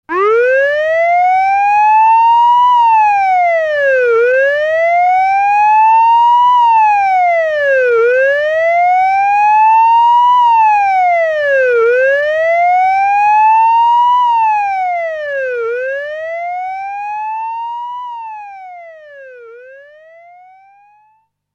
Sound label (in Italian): pompieri, suono della sirena dei pompieri; classica sirena dei pompieri